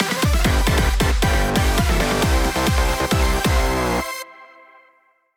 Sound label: Win Jingle